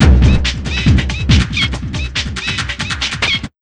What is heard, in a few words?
Big Beat Drumloops, 140; USB Soundscan vol.25; AKAI